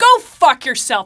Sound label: angry girl; 'Go Fuck Yourself' samples; lolicore